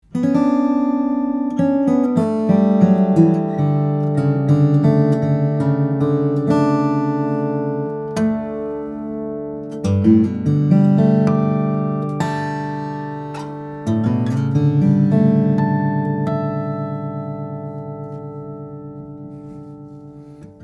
Grand Soloist, steel string flattop guitar; cocobolo; cedar; Ryan Nightingale

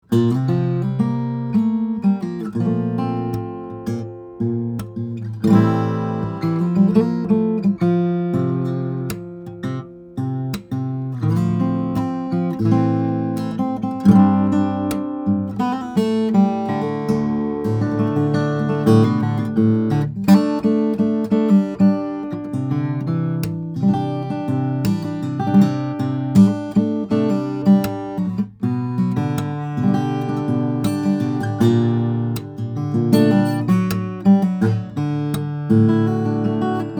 steel string flattop guitar; Collings 0001 Mh; all honduran mahogany

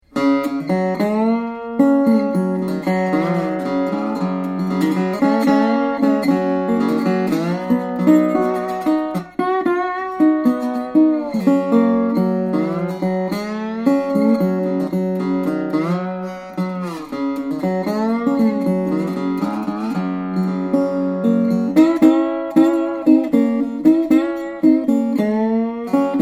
Maricopa Weissonator, resonator guitar; tricone resonator lap guitar

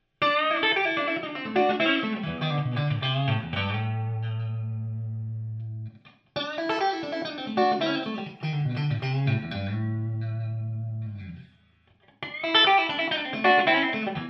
relic stratocaster, electric guitar solid body; monroe

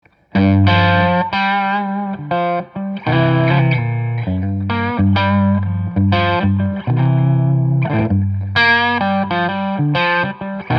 electric guitars; mahogany; maple; solid body; Knaggs; kenai j mt h2

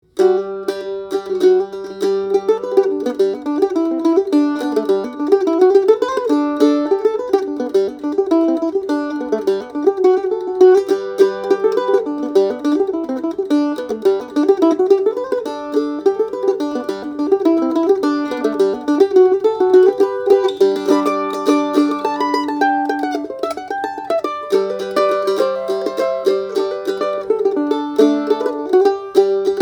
A Style Mandolin; condino; brazilian rosewood adirondack spruce

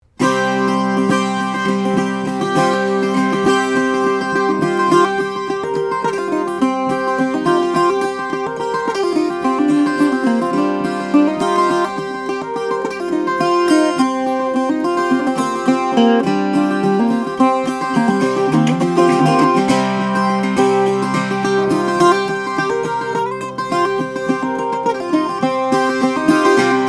Carbon Fiber, steel string guitars, flattop; New Emerald Amicus12 Opus Amber Carbon Fiber/Carbon Fiber

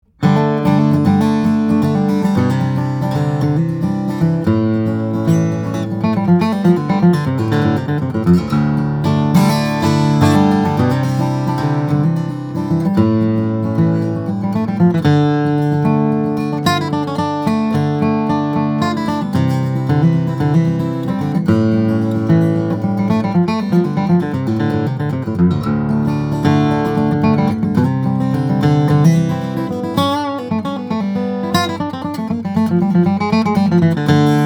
Boucher BG 152 M, guitars steel string flattop; madagascar rosewood; adirondack spruce

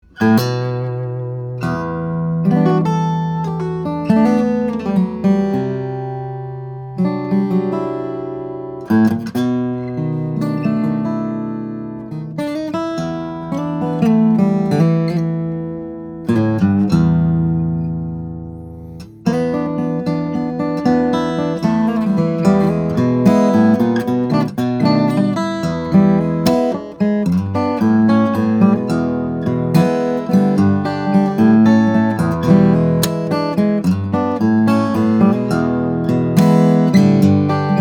guitars; 2004; blanchard; sugar pine; steel string guitars; flattop

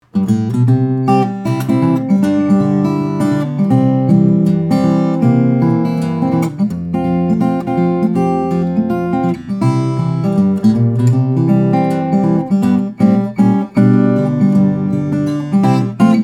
guitars; martin 00 custom shop; adirondack; flattop

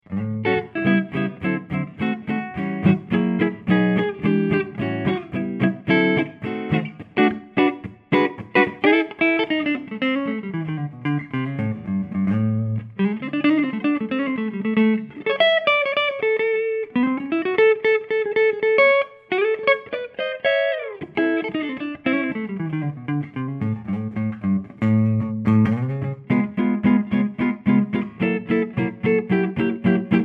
american archtop, acoustic; archtop guitars; american dream; dale unger

